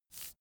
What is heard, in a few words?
cigarette sweep smooth